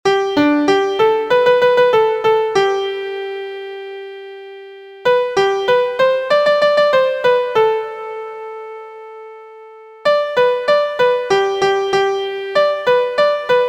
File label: MIDI